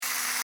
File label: Servo Mechanical SciFi Robot Toy, Scifi Servo E Mechanical Robot Small Motor Toy; BBFX